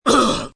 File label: Grunt Frustrated, Grunt, groan, impact, male, cough-like, pain, hurt (human voice); Groaning; VoiceBox